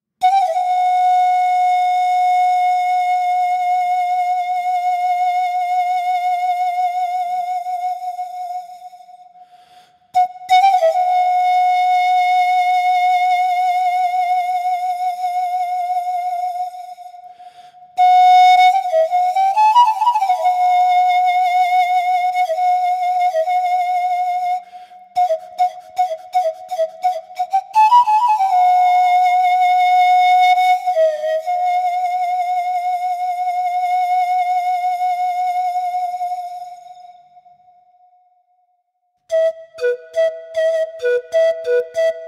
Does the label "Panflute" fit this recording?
Yes